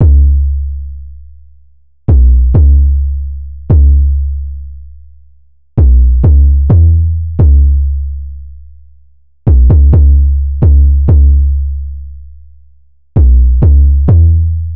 808 loop 130 C#m; Slate Digital BASSHOLE Sample Pack

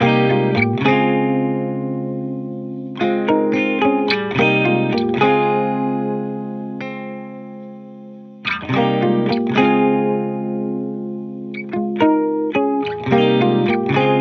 guitar loop 110 Fm; Pop; Guitar Stacks; SD PASADENA Pop Sample Pack; Slate

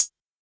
hat perfect, Closed Hat; Hip-Hop; Drum One Shots; SD CAICOS Hip-Hop Sample Pack; Slate

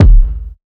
kick grunge; Drum Sample Pack; One Shots; Slate; SEISMIC

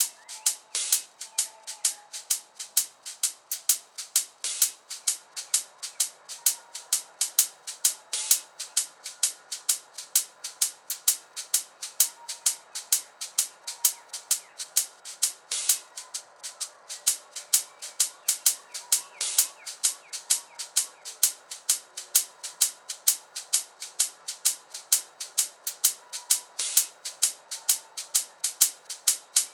hats 65, Hat Loops; drum sample pack; Dukono; SEISMIC; Slate

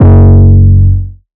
808 Bass Big F#3; Slate 808 Deep Dive; SD Signature 808s

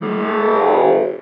vocal extend A; SD AURORA Sample Pack; Slate

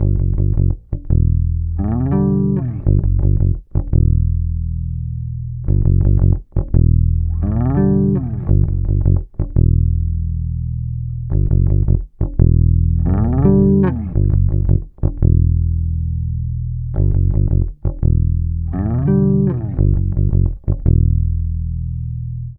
Bass loop 85 Em, Electric Bass Loops Direct; Slate Digital BASSHOLE Sample Pack

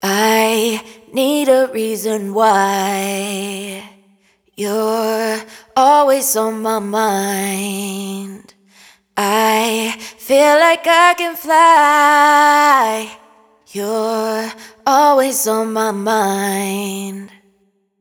hook 105 Dm wet, Vocal; Slate Digital Omnivox Vocal Sample Pack

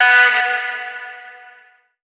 Omnivox oneshot B, Vocal; One Shots; Slate Digital Omnivox Vocal Sample Pack

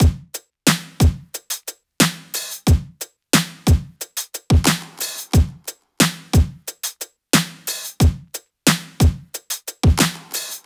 drum loop 90; Pop; Drums; Full Drum Loops; windover; Slate; SD PASADENA Pop Sample Pack

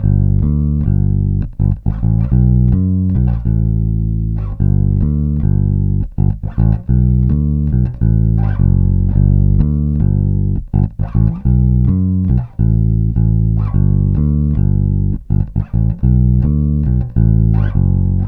Bass loop 105 D, Electric Bass Loops Direct; Slate Digital BASSHOLE Sample Pack

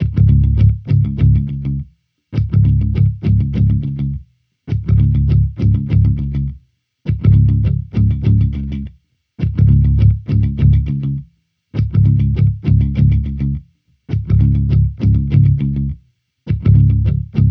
Amped loop 102 Db, Electric Bass Loops; Slate Digital BASSHOLE Sample Pack

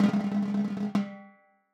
timbales High Roll; Raw Perc One Shots; Slate; SD SEISMIC Drum Sample Pack

